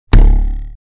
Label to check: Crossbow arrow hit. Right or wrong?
Right